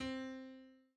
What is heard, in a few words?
bell; sound-theme-freedesktop